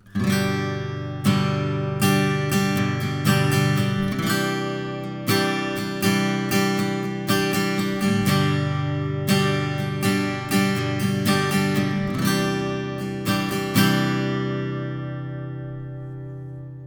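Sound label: at2020 stereo, acoustic guitar; zapis akusticheskoy gitari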